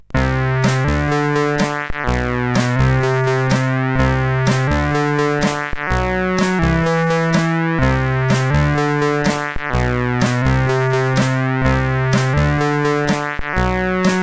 sid, CSG8580R5

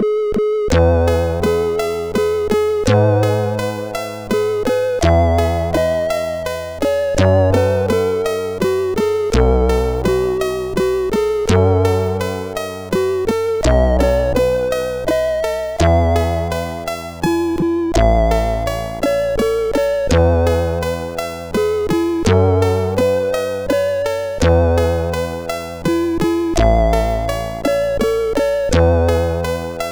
Cradle Songs, CSG8580R5

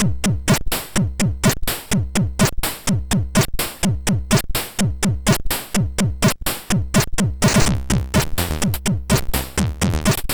Vice, sid MOS6581R3

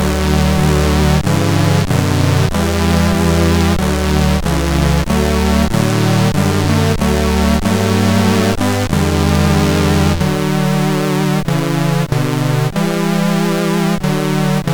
Hymne, sid MOS6581R4; HVSC; DDR-Hymne

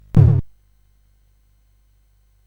Dark Castle, CSG8580R5

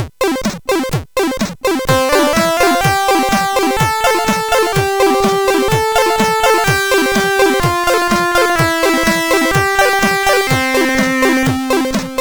sid 8580; CSG8580R5; Alle Meine Entchen Major; hvsc

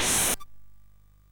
Shuffle, MOS6581R2

